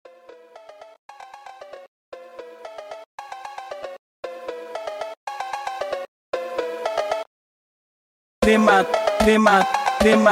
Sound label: Funk super Slowed